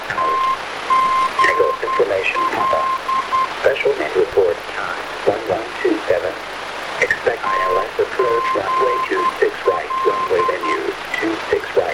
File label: VOR, aerobeacons; TGL-D-VOR; germany